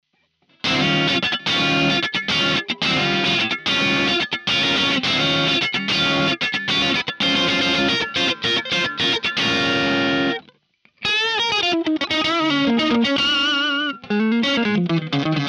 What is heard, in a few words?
Crunch